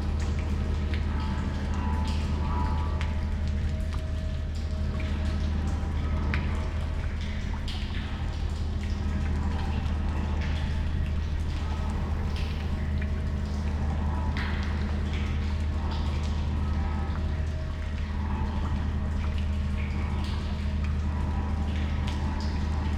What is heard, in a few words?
Lower Level Ambience; Ruiner